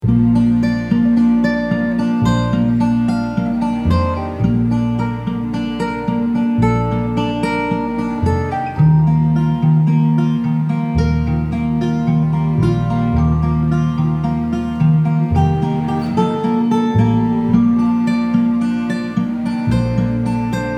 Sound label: Orchestral Guitar